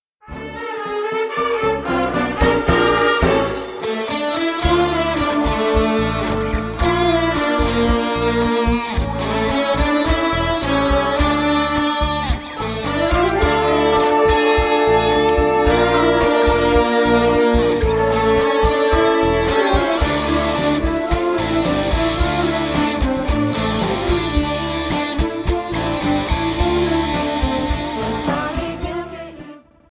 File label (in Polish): orkiestra